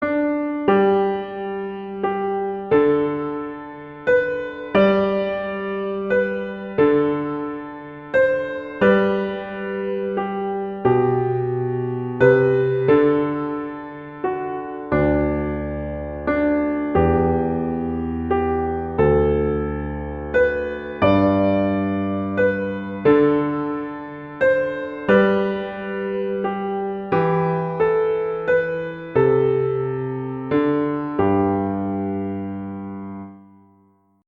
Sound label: I saw three ships